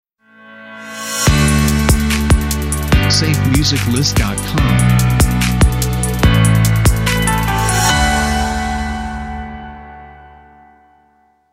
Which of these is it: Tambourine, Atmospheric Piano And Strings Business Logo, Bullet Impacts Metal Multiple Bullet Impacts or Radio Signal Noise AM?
Atmospheric Piano And Strings Business Logo